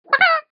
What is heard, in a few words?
chicken baka